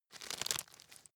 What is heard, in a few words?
nut creak; haus garten; kueche